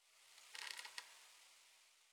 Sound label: wood strain, Night; FontainebleauDemo